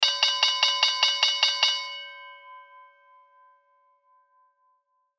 Bell Stock Exchange, Bell Stock Exchange Closing Bell; Buildings; INDUSTRY; Blastwave